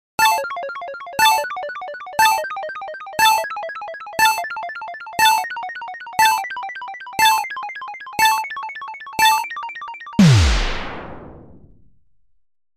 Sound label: Jingle